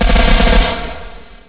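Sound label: weapon; PCGAMER Disc 2.10 NOV 1996; BGS DEMO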